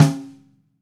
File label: Radio King Snare FF Room; Sonic Foundry (Sony Creative Software); Vital Drums